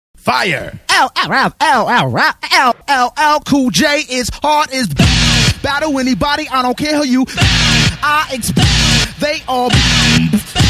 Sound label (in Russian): fire, битбокс